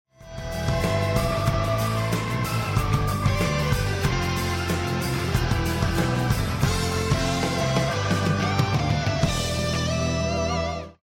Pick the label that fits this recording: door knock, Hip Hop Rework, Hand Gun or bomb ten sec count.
bomb ten sec count